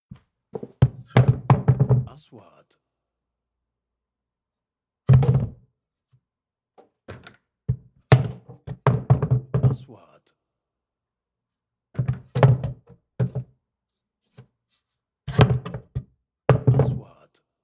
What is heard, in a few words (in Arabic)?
رمي الخشب